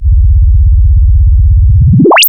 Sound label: SYN-FX